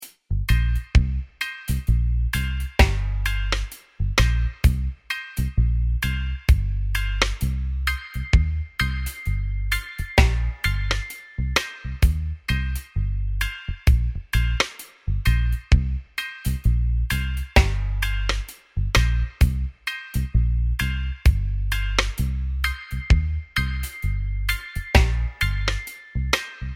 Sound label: beat; moh samples